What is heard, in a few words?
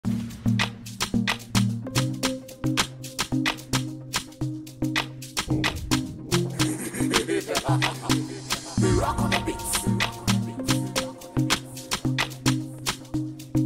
instrumental; prod by rayRock